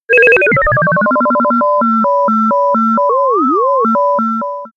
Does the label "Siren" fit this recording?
No